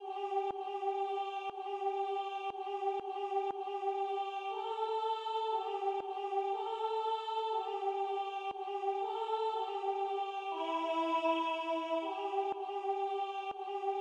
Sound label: alto; fear no danger